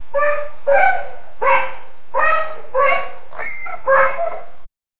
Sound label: dogs barking and cats meo; playful